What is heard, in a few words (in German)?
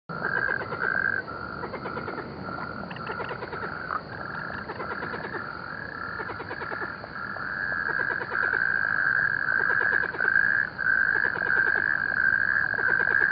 rufe seefrosch